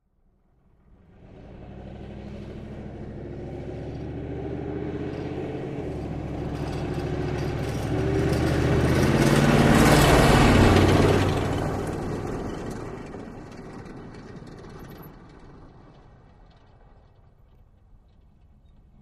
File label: Humvee: Various Driving Maneuvers.